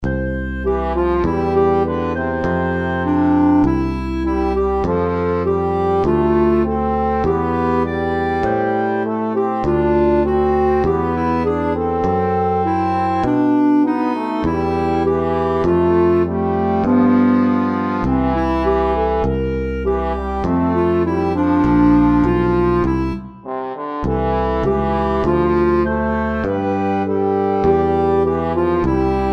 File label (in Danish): Trio; Lys og kaerlighed.